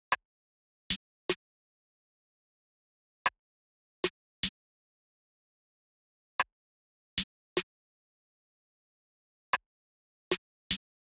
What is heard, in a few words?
153 bpm Perc; HEISTY